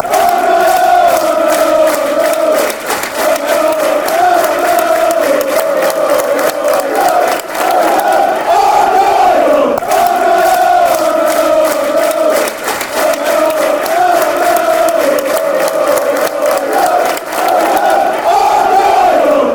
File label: argyle fast fanchants